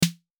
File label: Layered clap snare old school snare